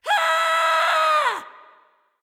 battle cry

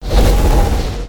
slither; smite; kukulkan

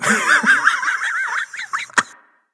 laugh; cuchulainn

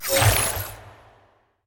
arachne webground projectile; smite

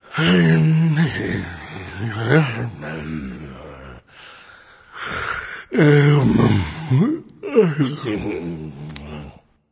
grumble; smite; kumbhakarna

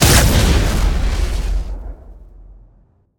fire; Smite; Baron Samedi

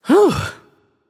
sigh; babayaga; smite

